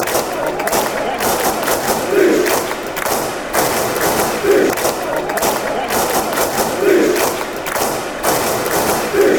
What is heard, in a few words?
fish clap